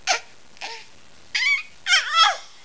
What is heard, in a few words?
baby